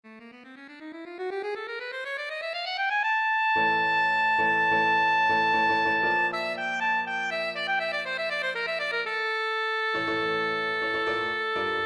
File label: Sax Alto and Piano; Bronsheim Music